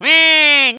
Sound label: wah; tf; waluigi